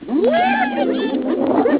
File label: Hyena